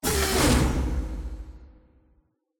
claw drop; SFX; Environment; RejectRoom

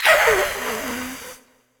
ghost witch voice hiss

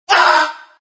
Scream

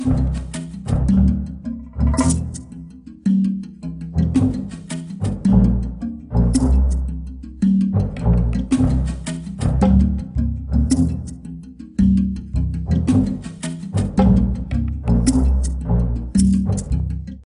Jungle Level

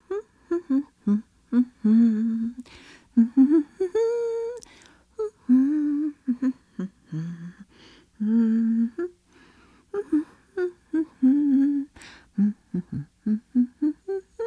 woman humming

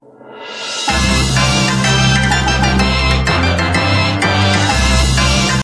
Jingle Clear all

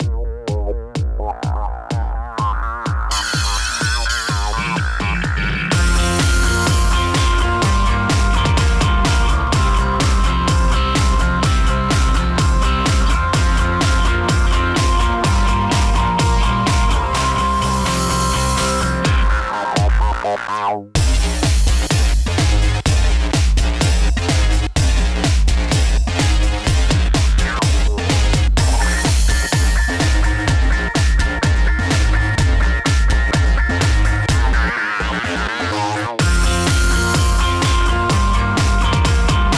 race

